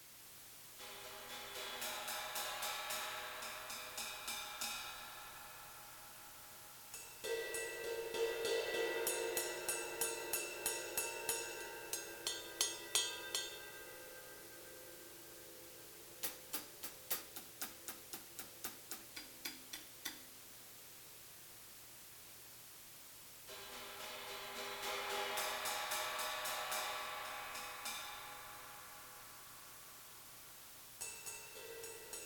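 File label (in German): Becken Sensibilität; ALESIS Strata Prime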